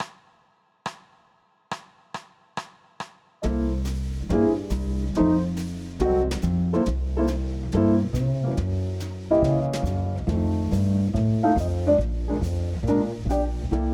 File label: C Major Blues Tenor; Applied Jazz Ear Training; Practice Perfect; 12 Bar Blues Ear Training in Real Time